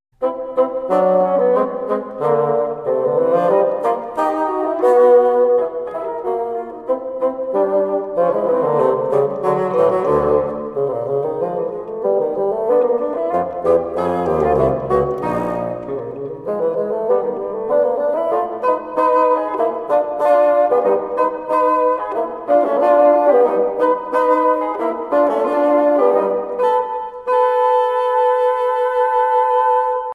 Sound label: Bassoon